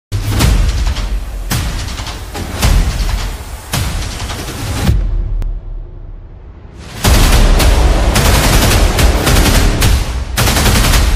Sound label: military ringtone army ringtone; black camando